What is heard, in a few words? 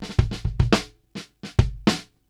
Beat Loops; Zero-G; Monster Beats